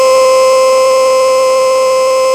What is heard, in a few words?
TX SUS C4, SYNTH; AKAI S-Series CD-ROM Sound Library VOL-2